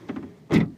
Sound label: Car Bagage Closing